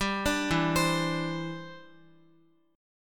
E Minor 7th Sharp 5th, Guitar; arpeggiated; x,x,5,7,4,7; 4E,4A,5D,5G,5C,6F; Em7#5

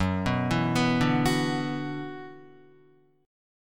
Gb 7th Suspended 4th Sharp 5th Gb Guitar; arpeggiated; JGuitar

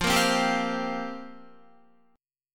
F Suspended 2nd Suspended 4th F down Guitar; strummed

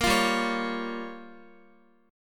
G Minor 7th Flat 5th, Guitar; Gm7b5; strummed; x,x,8,10,7,8

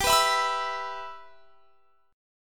G 6th; G6 strummed

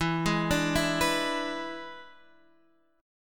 E 7th E down Guitar Standard; E7 arpeggiated; JGuitar

